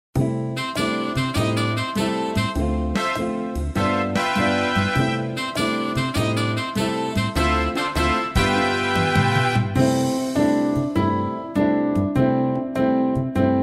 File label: Keyboard jogging, piano; pistas-piano